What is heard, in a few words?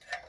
House fuse open; Phasmophobia